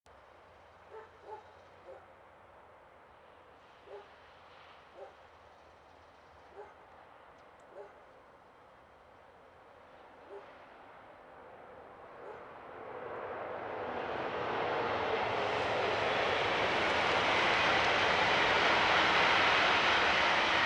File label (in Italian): Treno mono; Eigenmike; A-weighted; Nuova Strada